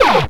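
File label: scratch, record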